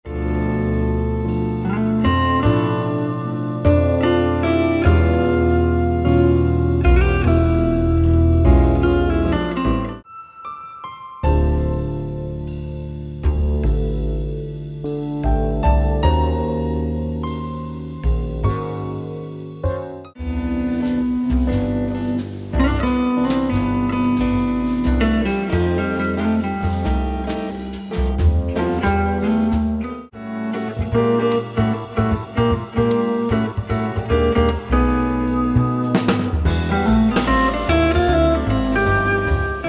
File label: jazz